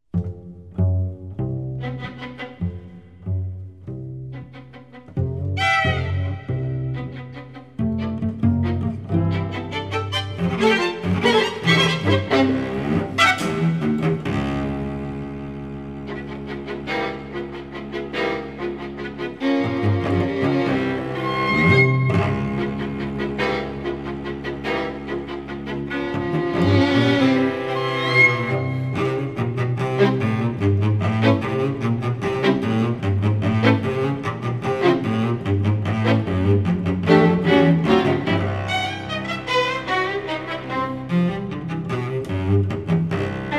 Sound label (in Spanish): RITMOS DE MALAMBO